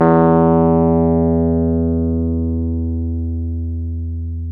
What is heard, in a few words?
PNO Wurly